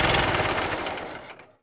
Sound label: car motor stop